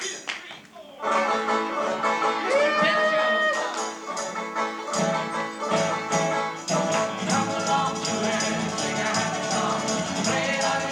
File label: traditional banjo song